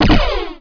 med laser hit; weapon

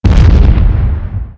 reaper hit, cannon; weapon

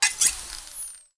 blade swing; weapon

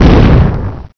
missile hit; weapon

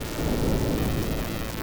laser burn; weapon

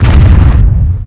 cannon hit; weapon

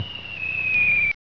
fire, weapon missile

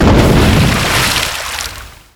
large water explode; explosion; wet

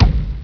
small pop; weapon

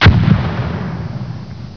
rapid rocket hit; weapon; missile

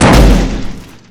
shell gun; weapon

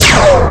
heavy laser fire; weapon

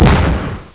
sabot hit, missile; weapon

